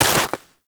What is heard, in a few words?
Operator Walk Snow, Footsteps; Warframe